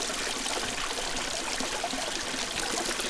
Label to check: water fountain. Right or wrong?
Right